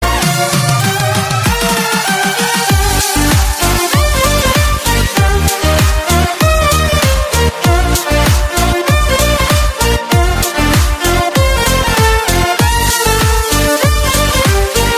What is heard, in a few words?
Violin Cover